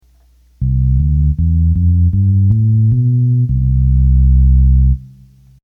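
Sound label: Bass Violin, Roland accordion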